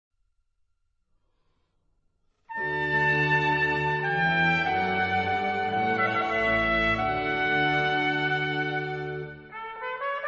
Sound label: Sonata in D major Grave; Corelli